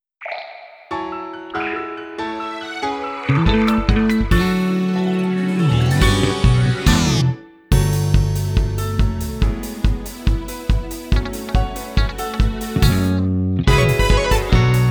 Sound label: Off Vocal